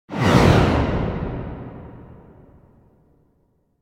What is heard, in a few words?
Symphonic Hit Horror Appearance Emergence Chord; Hollywood Edge; sound designer's toolkit v2